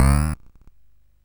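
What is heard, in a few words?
Nebulus, MOS 8580 SID chip music, games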